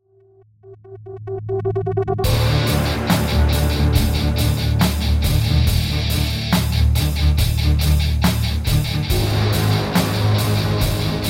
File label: title screen loop